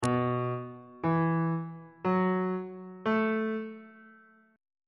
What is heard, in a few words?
c flat dominant 7th suspended 4th chord intervals on bass clef